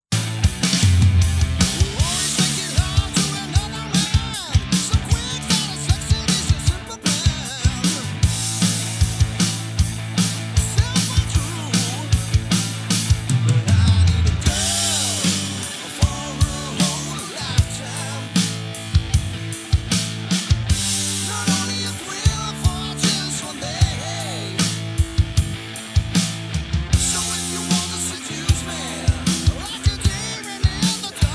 Master Rebalance Drums plus 1; iZotope Ozone 9

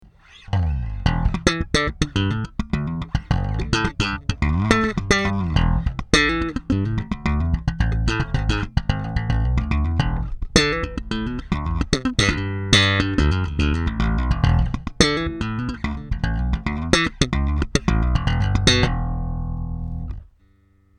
MiniMax slap

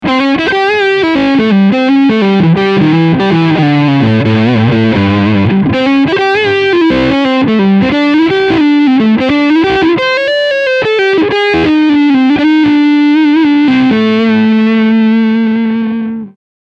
Front PU Overdrive Lick

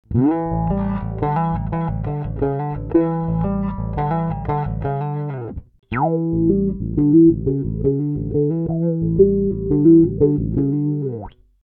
Rock Finger legato

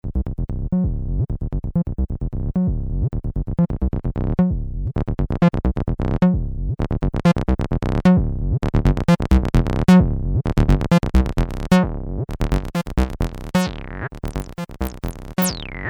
Xoxbox Slide Test

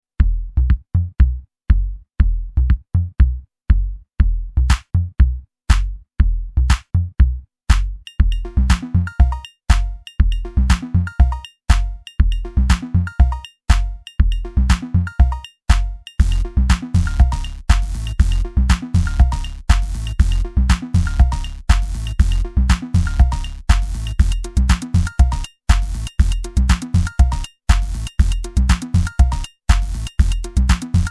korg volca sample groovebox

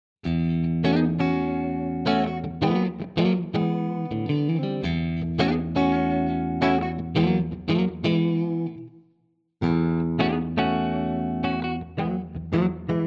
ESP SC 20 Position 2 5 clean

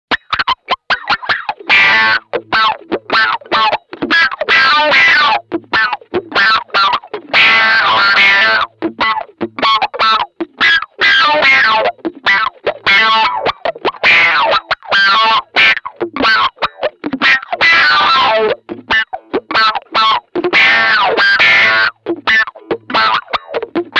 Bridge Pickup HP

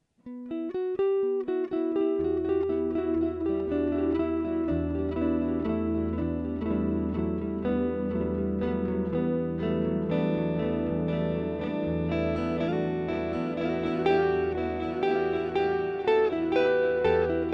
NUX duotime serial mono mod

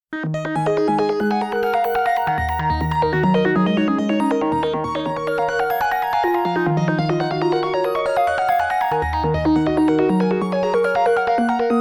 Sequential Trigon 6 Pretty Little Arp

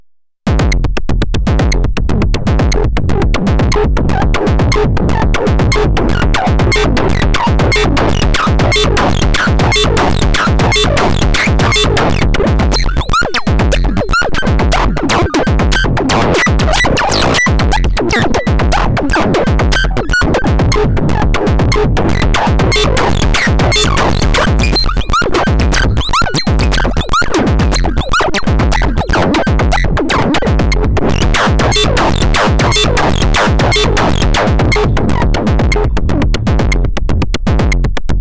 elektron analog rytm dfam

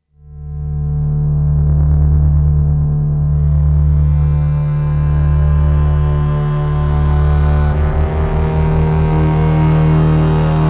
matrixbrute2 slow lead

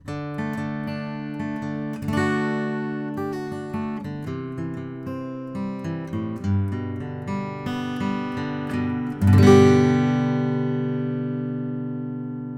Audio Technica AT803 Acoustic Guitar 13cm Picking

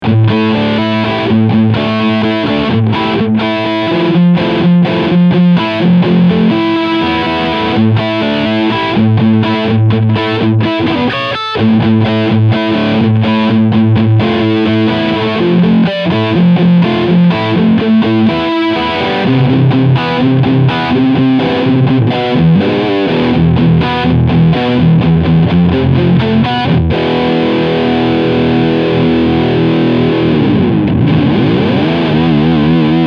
Riff High Gain

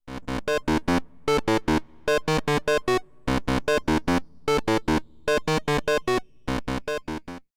Rossum EM Control Forge WT OSC SQR

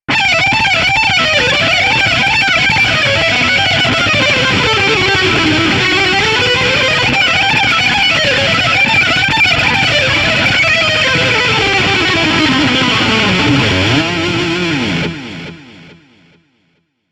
Speedlick

